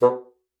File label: bassoon, B2; Xsample VOL-6